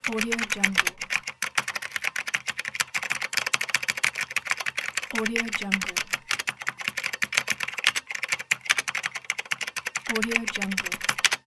typing

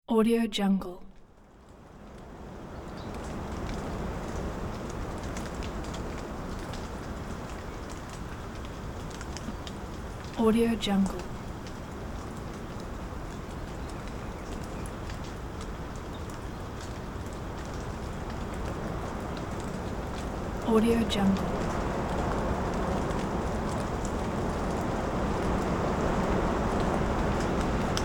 heavy wind with light rain

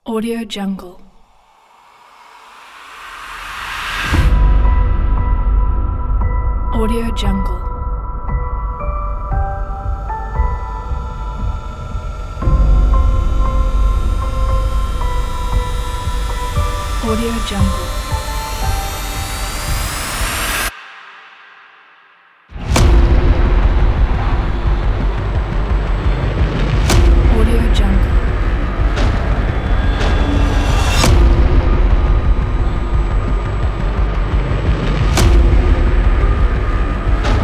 christmas horror trailer

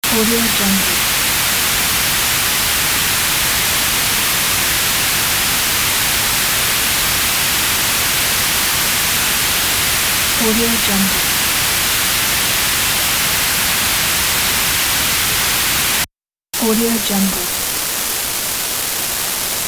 white noise

